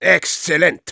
positive vocalization; Exzellent!; Spy

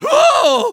flip exert

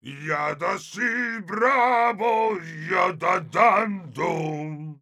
Heavy singing, es; Tras comer la Chocolatina Dalokohs o la Barrita de Pescado

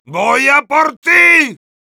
yell, es; Heavy

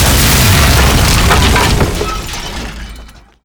tank smash

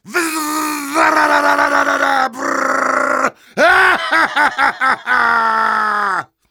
Heavy yell

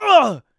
scout shove

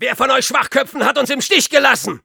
jeers, de; Soldier; "Wer von euch Schwachköpfen hat uns im Stich gelassen?"

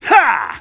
taunt exert; Sniper

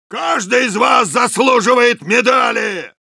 cheers, ru; Soldier; «Каждый из вас заслуживает медали!»

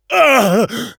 pain severe, fr; Engineer; Douleur intense 4

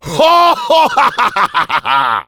Demoman laugh evil, fr

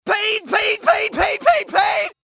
SCOUT pain pain pain

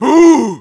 medic jeers, de

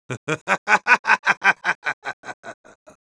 laugh happy; Medic; Risa feliz

